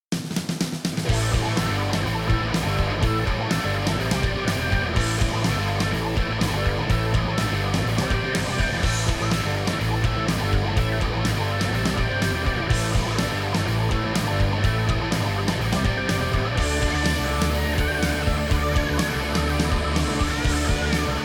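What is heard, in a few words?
rock organ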